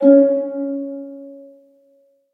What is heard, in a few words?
melody Db4; fairymirror